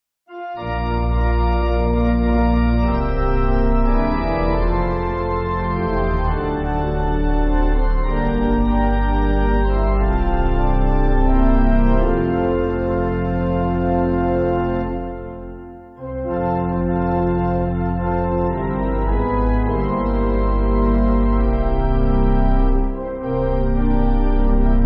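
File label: Pipe Organ, Backing Files for Churches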